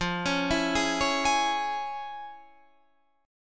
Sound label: F Minor Major 7th Sharp 5th, chord